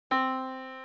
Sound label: Yamaha Disk, piano sound